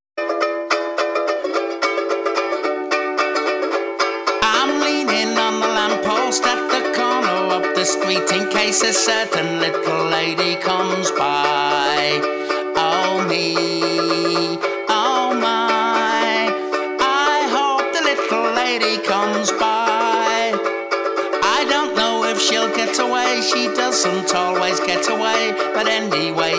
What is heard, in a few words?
no bass